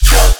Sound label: BASS SHOT